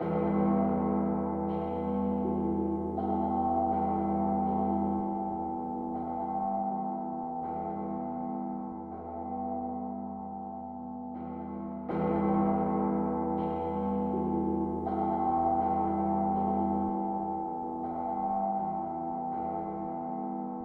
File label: Rainy Day Ambient